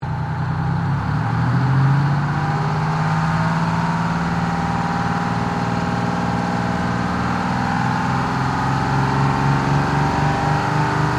Truck Kenworth 12V71 Rev Slow, Old Kenworth truck

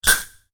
household medicine inhaler spray; Asthma Inhaler Single Spray; Bathroom

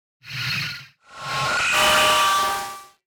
Distortion Left To Right; 5.1 STEREO MIX; Blastwave

